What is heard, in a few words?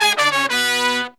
Horns, 120BPM